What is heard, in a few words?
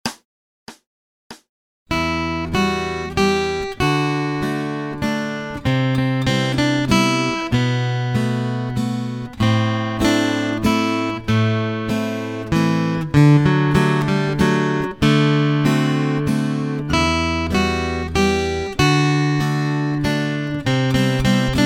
Acoustic Accompaniment, acoustic guitar; Grade 1